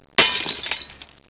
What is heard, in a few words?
glass break